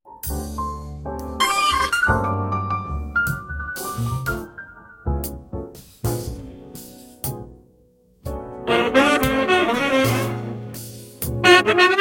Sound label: lets create a melancholic jazz